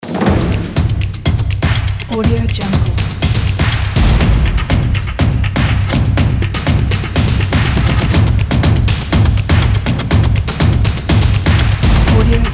drums stomps percussion

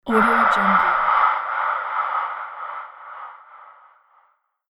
eerie transition

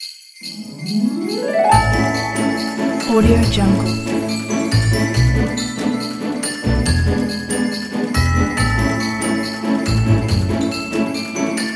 happy christmas logo

